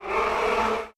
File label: elephant order; fauna